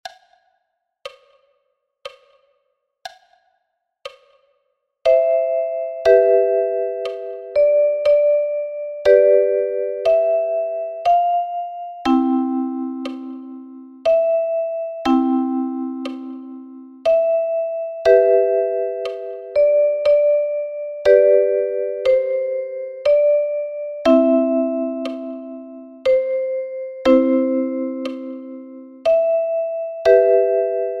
Deutscher Tanz, Kalimba; classical music themes

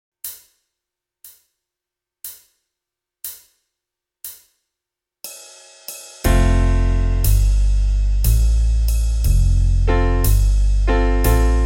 amazing grace band, Ocarina; 4 Loch Okarina

